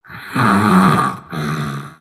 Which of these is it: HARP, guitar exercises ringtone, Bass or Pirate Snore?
Pirate Snore